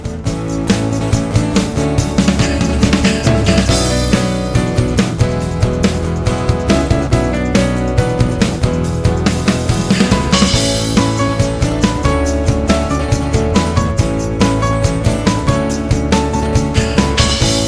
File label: Acoustic